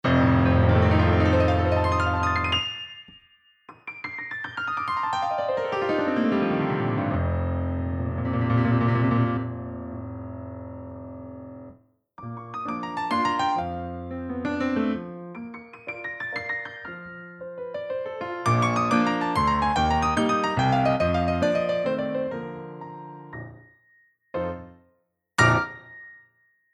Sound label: Sonata Waves Grand Rhapsody, virtual piano